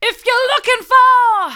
hard, vocal

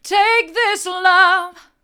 take this love hard, vocal

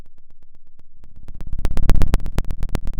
Sound of a fire crackling

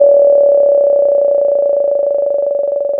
pop, Sound of a phone ringing

